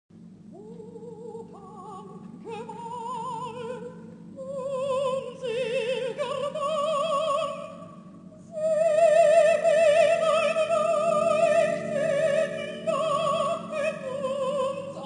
mezzo-soprano; musica classica

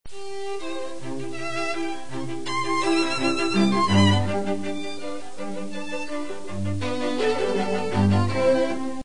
trio maior; musica classica

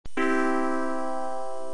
acorde maior; musica classica

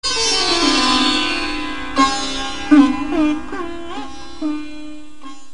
instrumentos sitar; musica classica

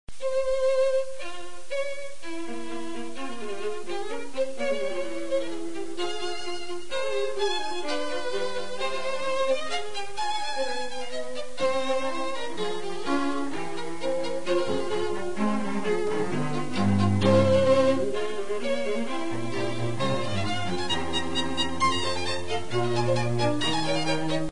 fuga; musica classica

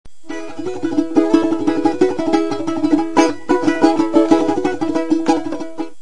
instrumentos balalaika; musica classica

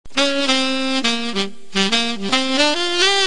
instrumentos saxofone tenor; musica classica

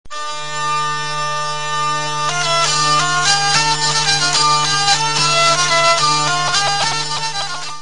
instrumentos viela de roda; musica classica

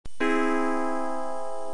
acorde aumentado; musica classica

